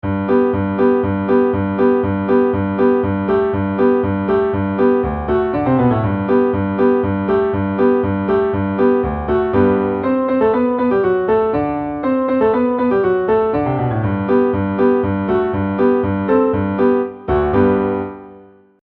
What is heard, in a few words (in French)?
polka, basson